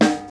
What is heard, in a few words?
warm snare d sharp key, Royality free acoustic snare tuned to the D# note; snare drum samples